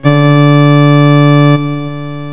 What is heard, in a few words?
ORGAN NOTE